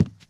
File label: cardboard, footsteps, run